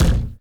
BD

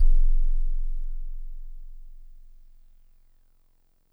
DreamLike DX100 F1

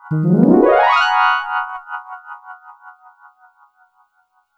Synth, Waoooong Multi

